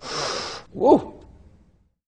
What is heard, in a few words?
SNIFF whew; Voice